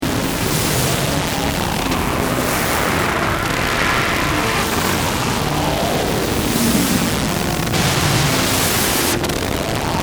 grinding pink

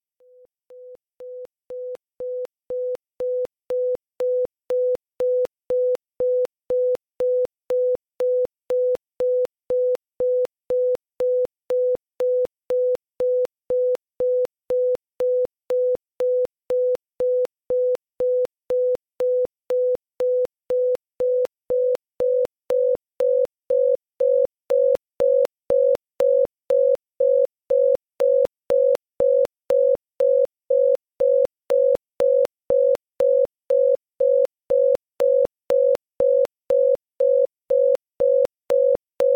Beep

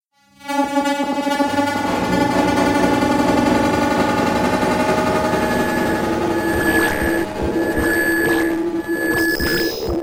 glitch

